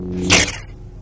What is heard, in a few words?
Lightsaber